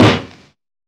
boom snare drum sample a key, Royality free acoustic snare tuned to the A note; snare-drum-samples